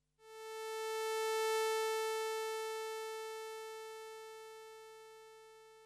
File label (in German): Attack Decay, Durch Einstellen der Regler Attack und Decay lässt sich ein langsames Anschwellen der Lautstärke erzielen; virusc; Einfache Tonbeispiele